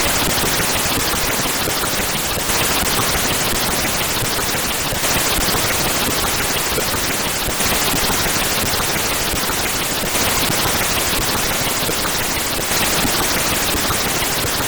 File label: intro, MOS6581R4; Kiwi Magic